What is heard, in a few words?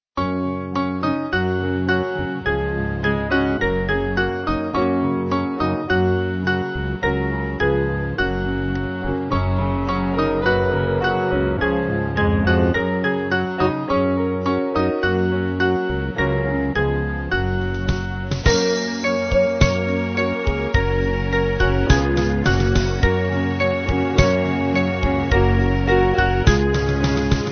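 band, Music Backing Files for Churches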